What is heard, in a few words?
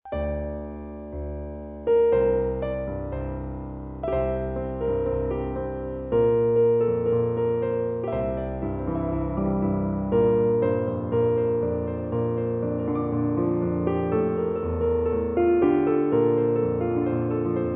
Piano